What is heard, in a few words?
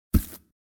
cut; fruit master